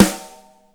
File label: warm snare sound g key; snare drum samples